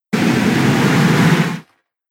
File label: Slow Deep And Long Swish, whoosh